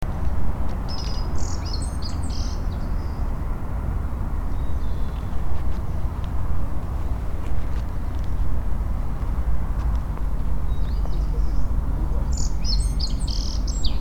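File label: black-faced bunting; aoji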